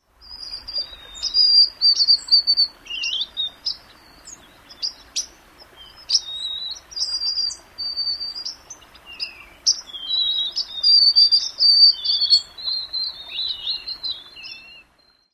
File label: early autumn subsong & calls